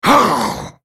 spell cast effort; Volibear; Character Voices - English

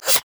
blinding dart; LoL; teemo

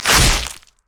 basic attack claw; rengar

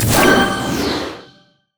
trinket orb; LoL; items

